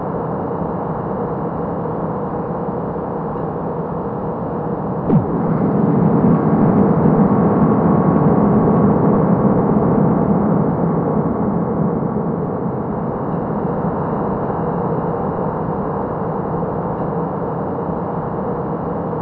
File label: citadel drone loop; Garry's Mod; hl2